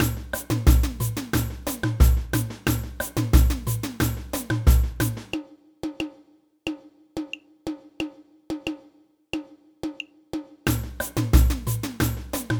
repinique, samba; samba band

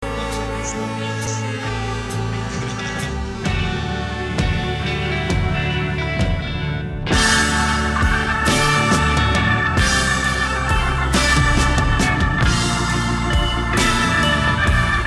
baritone, choirparts

